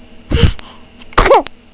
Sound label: SNEEZE